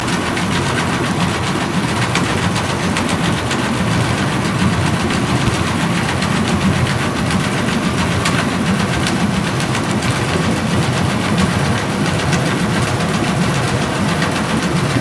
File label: Hydrophones, orcasound